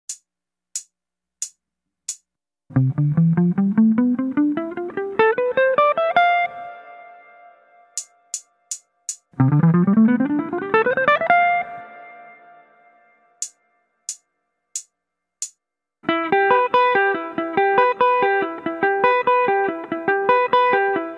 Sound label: guitar workout